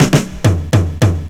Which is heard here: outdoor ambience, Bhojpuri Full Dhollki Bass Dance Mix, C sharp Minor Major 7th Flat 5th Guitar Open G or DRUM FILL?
DRUM FILL